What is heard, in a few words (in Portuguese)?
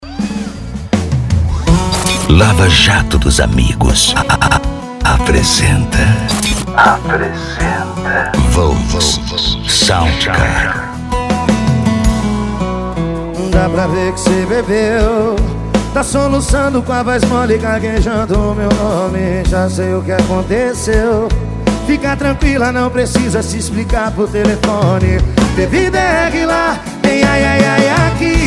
sertanejo e pisadinha; cd volks sound car